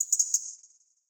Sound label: land bird; smite; chaac